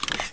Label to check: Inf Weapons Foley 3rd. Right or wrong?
Right